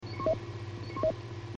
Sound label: beep